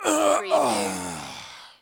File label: Human Death, Male Dying Sigh, Grunt